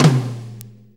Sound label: 4-4 Tom Flam, Drum Loops